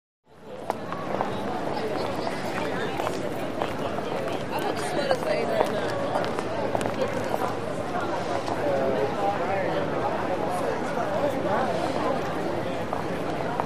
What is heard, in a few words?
Low Angle Crowd Foots, group